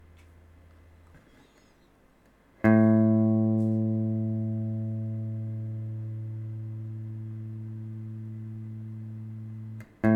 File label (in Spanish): Sonido de guitarra eléctrica cuerda 5 La A; afinar una guitarra